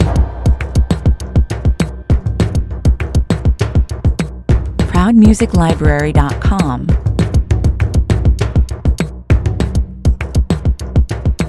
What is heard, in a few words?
rhythm section